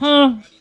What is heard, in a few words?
huh, Voice